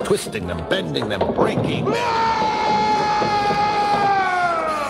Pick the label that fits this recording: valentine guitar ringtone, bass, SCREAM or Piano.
SCREAM